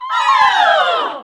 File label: HAL CL. SIN, CL. SINGIN`; Sound & Vision - Gigapack II CD 1